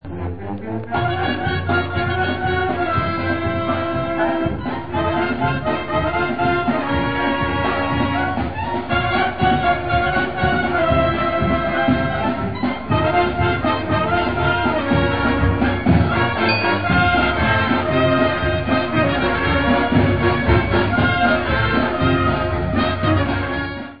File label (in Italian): classica